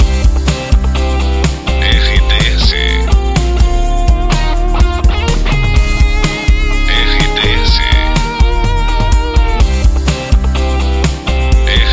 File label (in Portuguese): trilha para locução; Pacote Itapoan FM, Salvador/BA, uma emissora com característica jovem